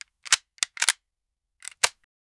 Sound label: shotgun loading